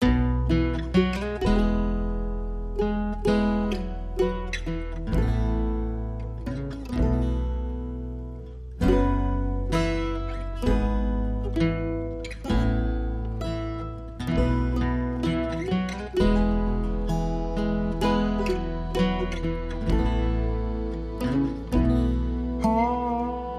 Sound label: Folk Ballad; Folk Ballad 1